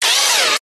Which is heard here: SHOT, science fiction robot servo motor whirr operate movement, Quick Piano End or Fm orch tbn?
science fiction robot servo motor whirr operate movement